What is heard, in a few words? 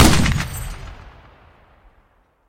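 long drawn-out sound after shot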